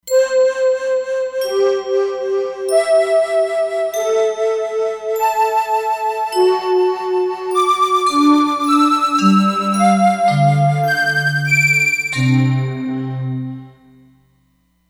Breathing, E-mu Proteus-3; Factory patches

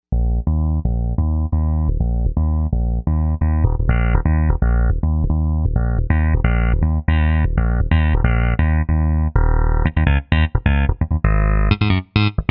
Digital Slapp, Novation UltraNova; Factory Patches

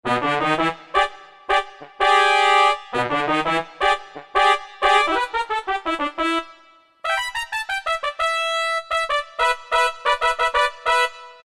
BR Soul, Yamaha TG77; Factory Presets

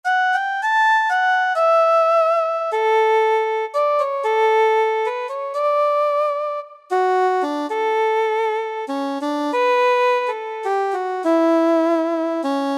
Breath Sopr; Generalmusic Equinox

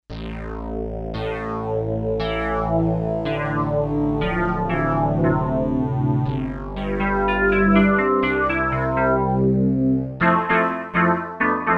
Kawai K5000S; Viby

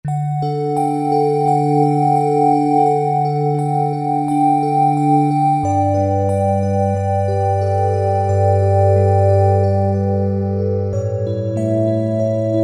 Krystal Bell, Roland V-Synth; Factory Patches

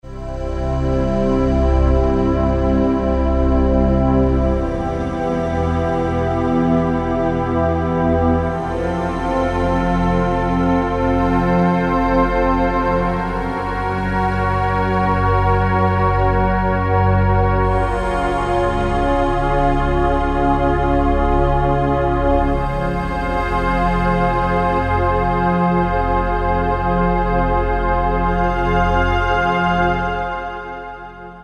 Slow Sunset, Korg N364; Factory Prog demos

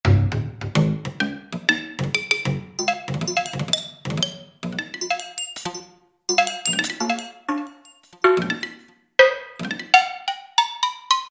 Percussion Set; Roland PN-D50-02

